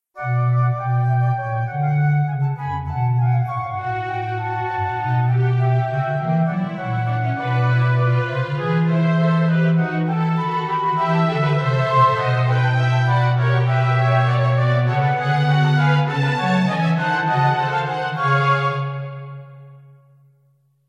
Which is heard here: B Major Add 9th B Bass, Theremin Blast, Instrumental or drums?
Instrumental